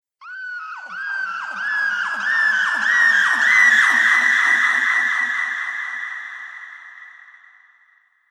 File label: Screaming Surreal Horror